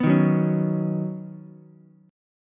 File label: Db 7th Suspended 2nd Suspended 4th, Ukulele; 4,3,2,4; strummed; chord shape; JGuitar